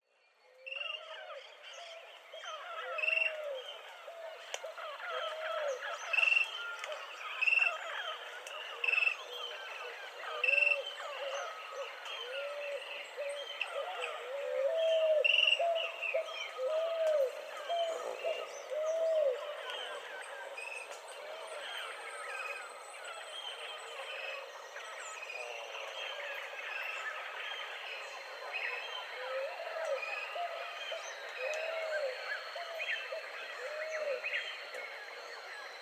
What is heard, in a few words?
Atlantic Forest; Juan